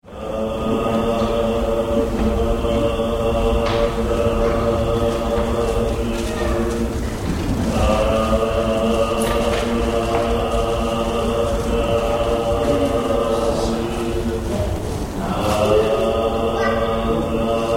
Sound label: monks; JAPAN